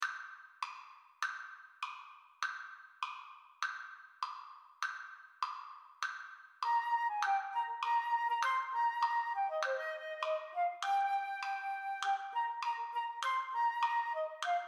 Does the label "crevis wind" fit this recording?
No